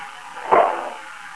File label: Ghost Bang